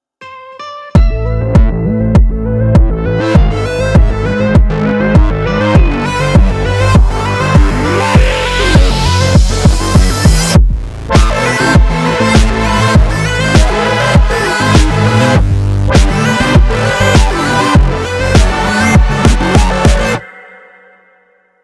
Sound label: boss part2 head 200bpm